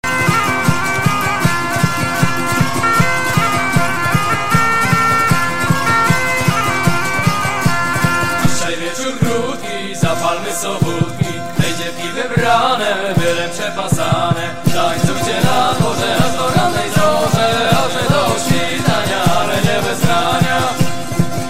Polish Folklore

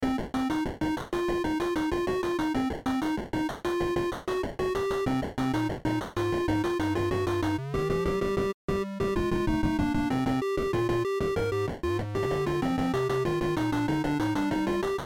Chip Tone; Osomatsu

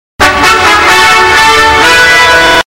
flute; children

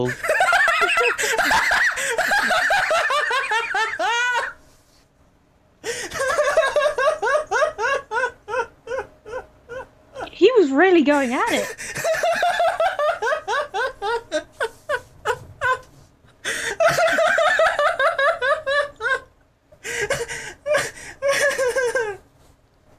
Cry Laugh

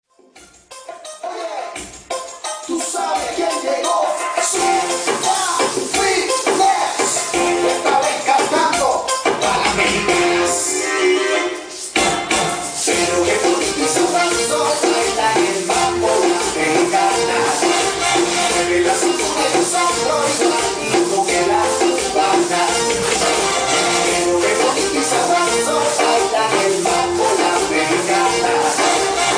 mambo reggaeton

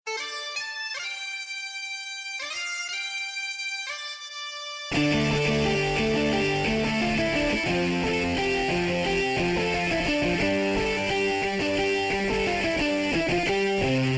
rock intro

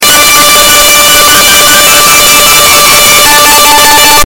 Guitar Alarm

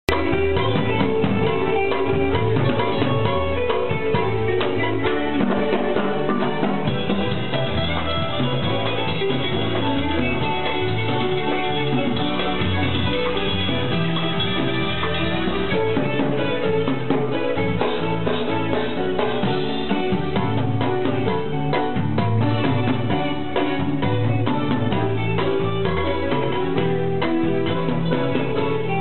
italian music

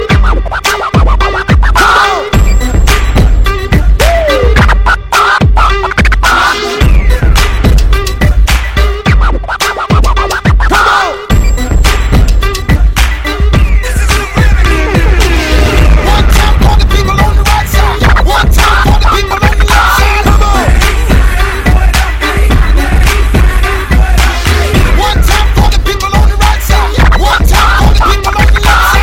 black beat, hip hop

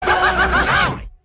Laugh

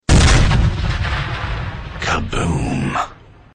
ka boom

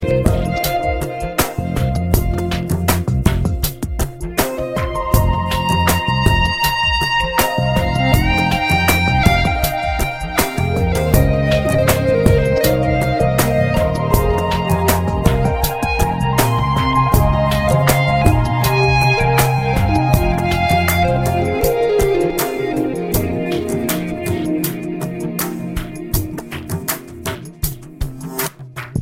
kerala flute; ringtones

